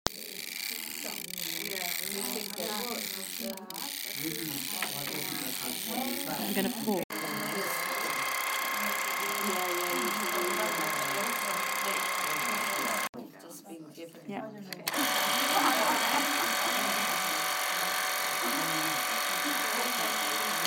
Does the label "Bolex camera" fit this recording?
Yes